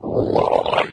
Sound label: melee, flood